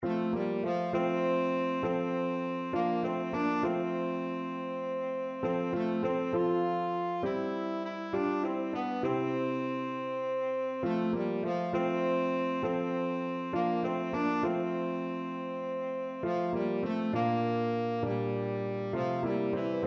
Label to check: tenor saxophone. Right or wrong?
Right